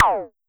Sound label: Pollard Perc, Syndrum